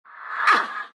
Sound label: pain; dota; death prophet